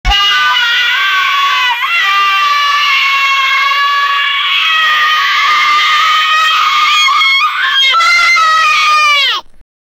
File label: children shouting cause